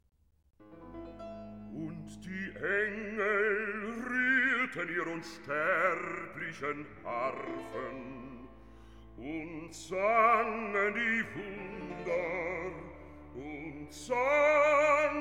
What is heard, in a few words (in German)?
Rezitativ; Und die Engel rührten ihr unsterblichen Harfen